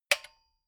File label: metal button stereo spring click fast